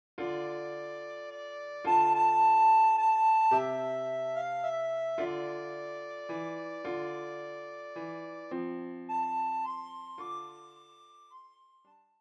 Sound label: Scarborough Fair